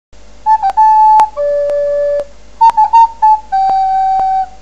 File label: flute